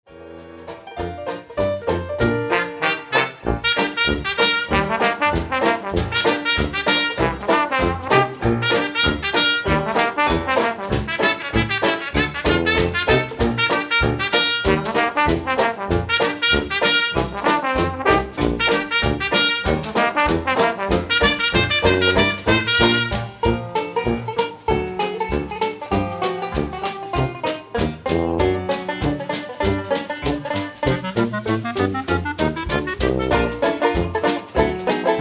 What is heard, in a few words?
ragtime